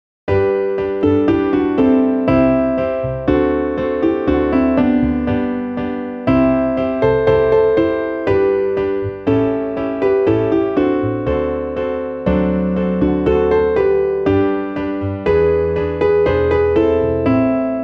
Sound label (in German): midi oevelgoenne klavier; Oevelgönne